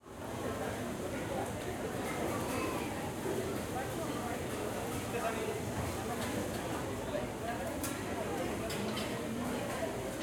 Crowd Interior Shopping Mall Food Court Restaurant, Children, Kitchen Sounds; Surround; 5.1; 2496SoundEffects